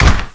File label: shoot; solarwolf